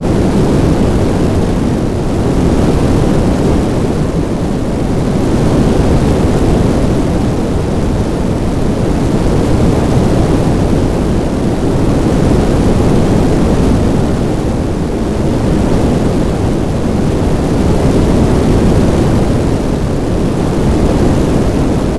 hell fire; horror

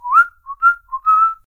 whistling; human

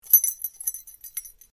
clink my keys

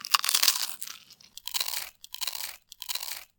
soft serve cone, eating

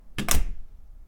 bath door close

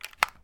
plug in USB

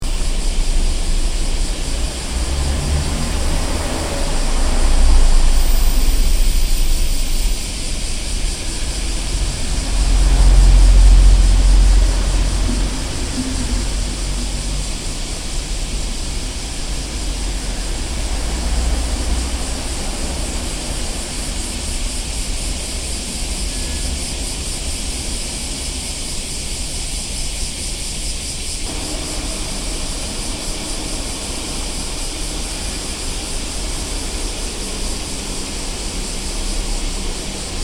summer streets

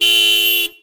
horn, car

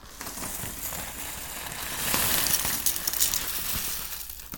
put cereal plate; eating

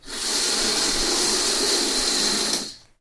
large curtains

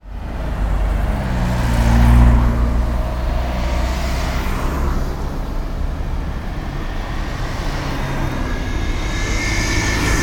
city traffic

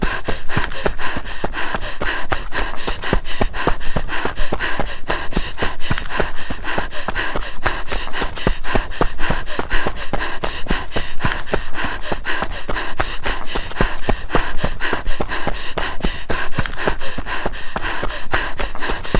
run in forest; horror

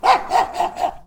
dog

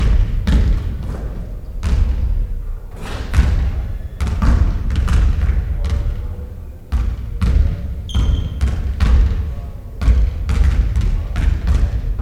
basketball training

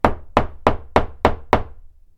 striking a nail

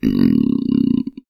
hungry, human

